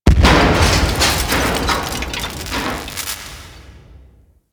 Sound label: Reactor Explosion; Ambience; Warframe; Infested; Golem Trial